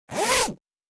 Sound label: Small handbag zipper